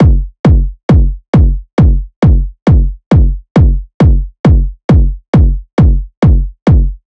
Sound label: Kick Loop 135, Techno Drums